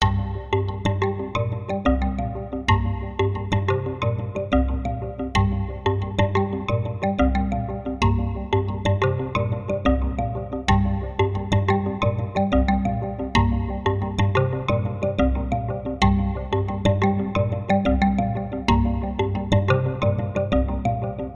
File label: Percussive Loop